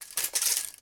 industrial tools tape measure metal tape movement; sound effects one

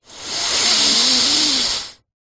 human male breath in through nose stuffy

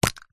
leisure can silly string lid remove

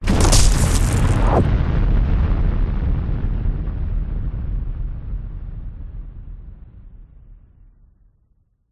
cinematic hit explosion debris whoosh; zapsplat

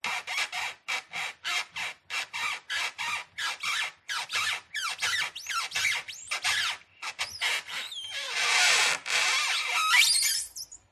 Wood Stress Creak Squeaks; DPA4060; pmsfx

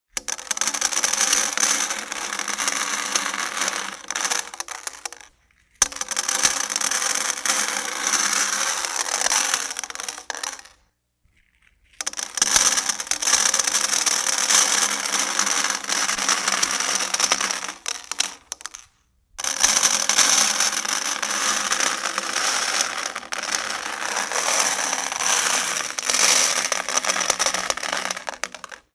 food rice poured into dish 4 times; sound effects one

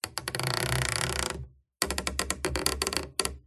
Stress Creak Squeak Groan; PMSFX

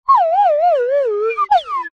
cartoon descend slide whistle fall down

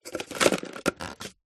food drink plastic fast food cup lid put on cup; zapsplat

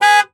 Car Horn Beep; Blastwave FX

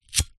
household cigarette lighter light; zapsplat

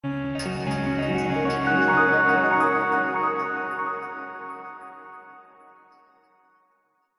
fantasy magical musical glisando; zapsplat

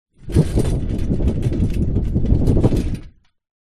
industrial road sign portable wobble

